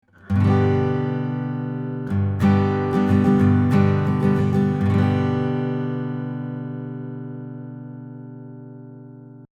Tascam Model24 AGit Schoeps Mk4 Gain 3 Uhr EQ High -15dB